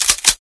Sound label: spas12 pump, weapons; mistake coop